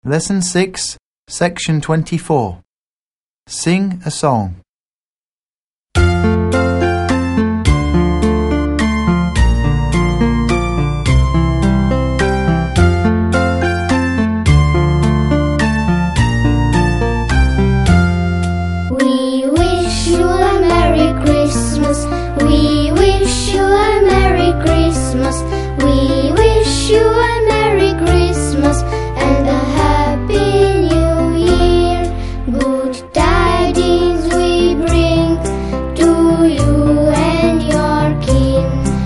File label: song we wish you a merry christmas; busy bee 4